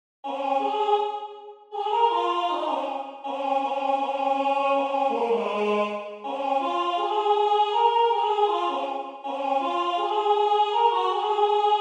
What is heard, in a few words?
MIDI SATB